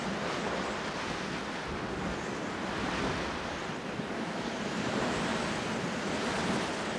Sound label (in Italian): aria, natura